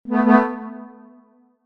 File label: incorrect